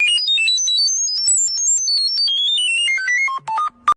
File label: Beep Boop; Soundpad